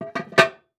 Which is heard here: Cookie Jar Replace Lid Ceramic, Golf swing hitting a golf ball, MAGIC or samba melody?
Cookie Jar Replace Lid Ceramic